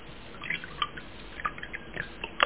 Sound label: pouring juice